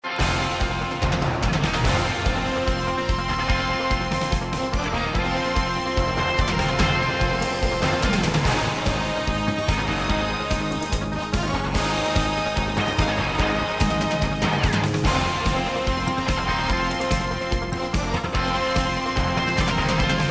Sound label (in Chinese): 片头音乐